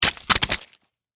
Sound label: Splat Guts Fall, Wet, Juicy; Horror; Blood and Guts; BlastWave